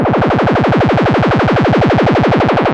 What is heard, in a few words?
Loudest frequency 686 Hz Recorded with monotron delay and monotron - analogue ribbon synthesizer; instruments; loops